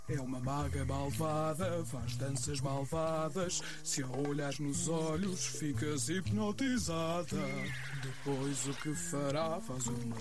(European Portuguese); Flutterguy's Evil Enchantress; Season 1

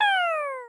player death

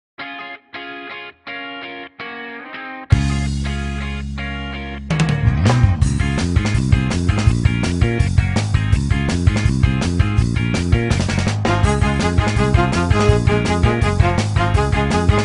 Ska Arrangement; mlp

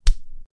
Smack; Lewd SFX

